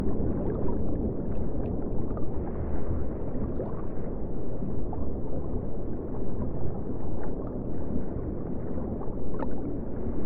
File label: Ambient Underwater; Anamnesia Part 1 Am I My Body